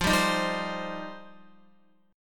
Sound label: F 7th Suspended 2nd F down Guitar; F7sus2; strummed